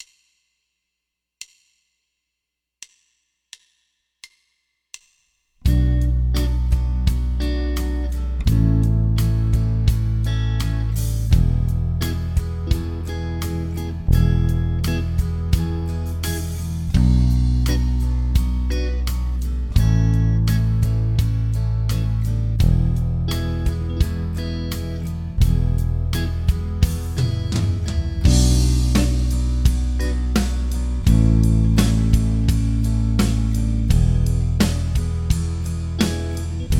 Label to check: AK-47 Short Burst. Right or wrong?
Wrong